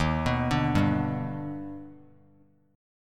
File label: D sharp Augmented Major 7th D sharp, chord; arpeggiated; 15,18,17,17; JGuitar